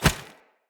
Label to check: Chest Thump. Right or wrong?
Right